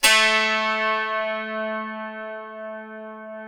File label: A3, DULCIMER; SoundBytes; Early Patches